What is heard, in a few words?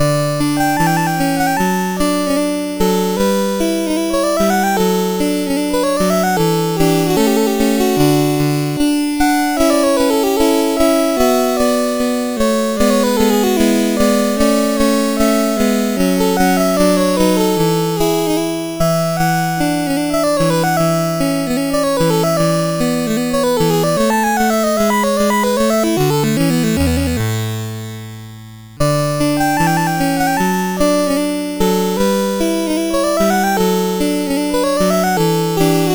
Adagio from Sonata in D Major, CSG8580R5